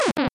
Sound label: lose; sfx